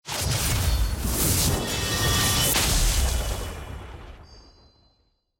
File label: sfx regalia division promotion generic